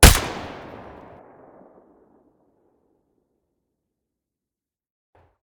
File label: ak105 silencer mono, weapons; STALKER; IWP